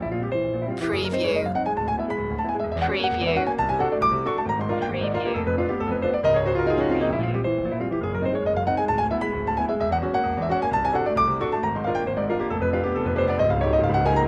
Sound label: piano echoes loop